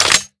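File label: sniper reload empty release; gmod; iw8; xmike109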